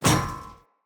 Sphere Polearm Catch, Weapons; Player

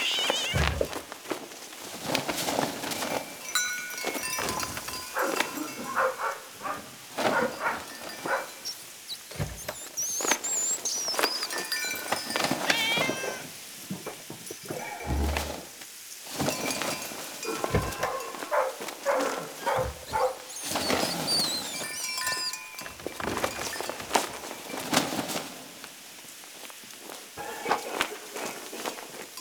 Ambient Loop, Village Exterior; Warframe; Eidolon Plains; Ostron Village; Ambience

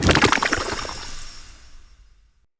Sap Crate Destroy; Neathuns

